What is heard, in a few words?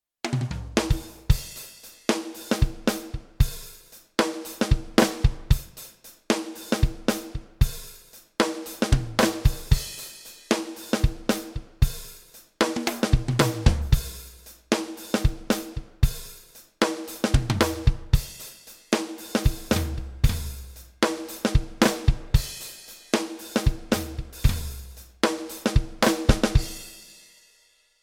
allen heat zed10 usb